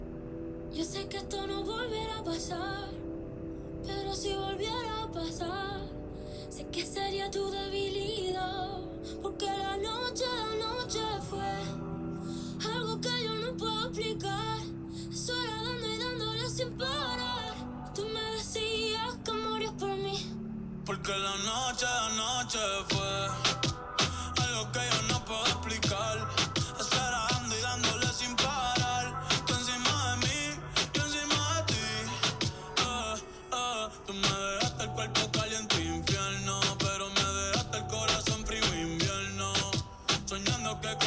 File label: pop